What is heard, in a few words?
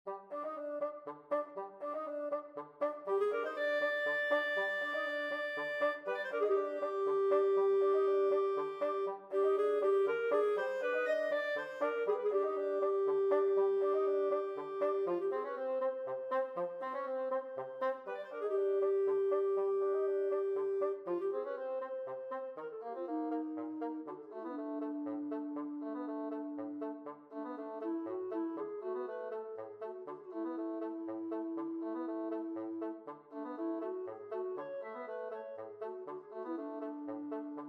Bolero, clarinet bassoon duet